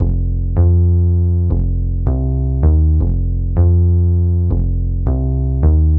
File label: Bassline Bass; Sonic Foundry (Sony Creative Software); Knutrix Drum & Bass